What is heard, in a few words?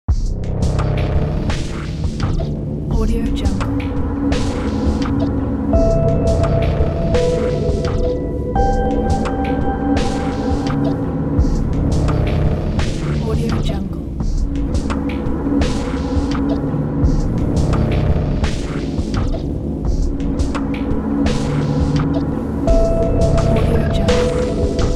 the suspense